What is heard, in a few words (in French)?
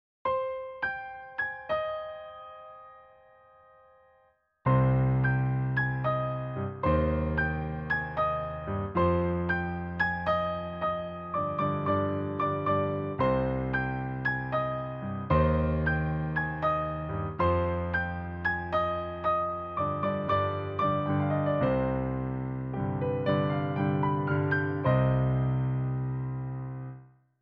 Musique en gare; SNCF